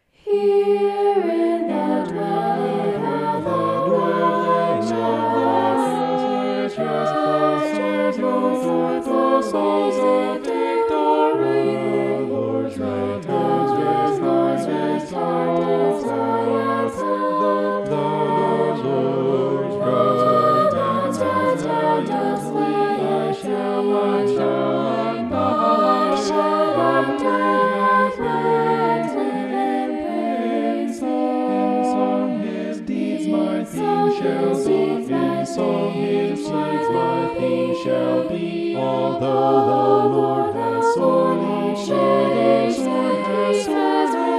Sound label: vocal poly; psalm 118